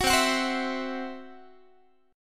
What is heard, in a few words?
C Major Flat 5th Guitar; CMb5 strummed